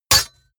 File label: sword hit metal